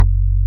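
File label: Pluck Bass; Best Service Gigapack 2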